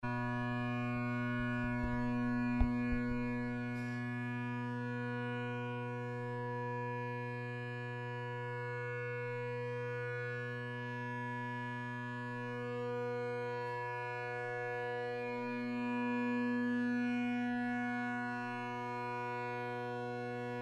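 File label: tenors bass, bagpipe; ezee tenors; duatone bass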